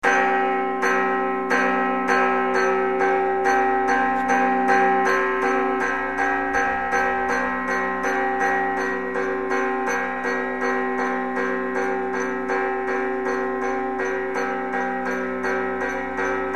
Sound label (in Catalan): Toc de Sometent Torre Catedral de Solsona; 11 setembre 2017; Festes Cultura